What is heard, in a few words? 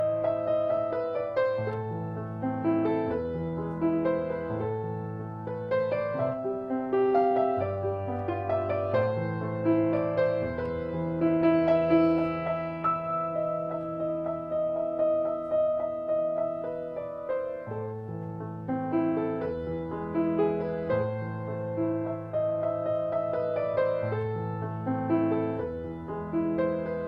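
Beethoven Für Elise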